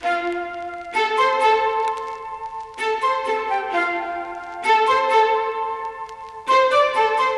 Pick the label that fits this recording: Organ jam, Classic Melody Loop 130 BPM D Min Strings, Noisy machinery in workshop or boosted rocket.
Classic Melody Loop 130 BPM D Min Strings